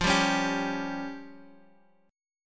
F Suspended 2nd Sharp 5th F down Guitar Half Step Down